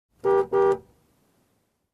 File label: Car honk twice from inside